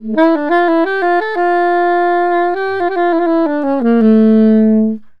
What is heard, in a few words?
SAX, Balkanish Traditions